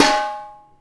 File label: armour hit; AoM-ARTofMyth